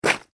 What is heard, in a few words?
human footstep hard snow ice; Single Footstep On Hard Icy Snow; Footsteps; McKinney Sound